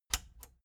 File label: plastic button latch scratch enable slow